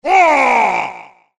sms; Clash of Clans

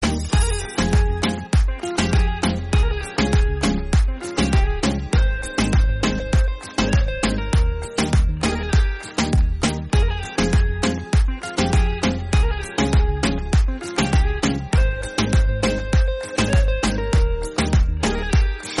romantic ringtones for android phone

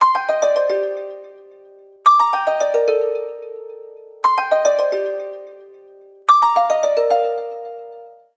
Rhythm

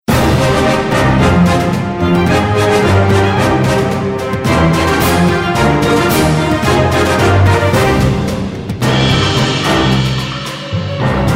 ringtone; Clash of Clans

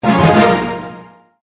jingle; Clash of Clans

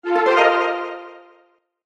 jingle; Clash of Clans

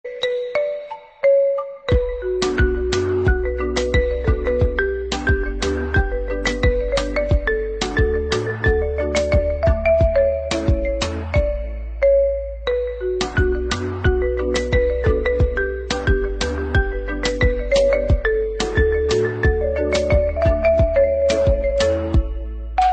iphone ringtone

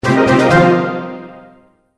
jingle; Clash of Clans

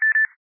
Beep Beep

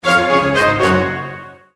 jingle; Clash of Clans

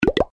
sms; Clash of Clans

